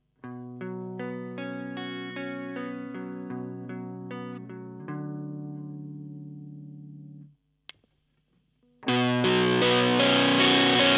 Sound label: EQD Plumes Mode 3